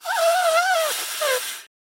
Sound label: woman blowing nose